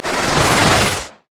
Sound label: surging tides; nami base